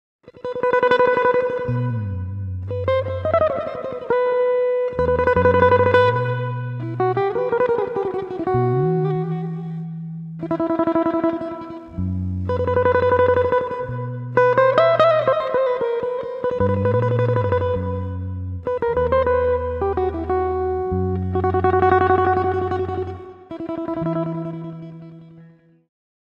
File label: Symphony in Raga simhendramdhyama